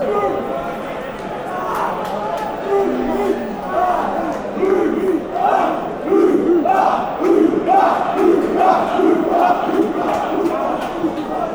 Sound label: ooo aagh, chants; wafc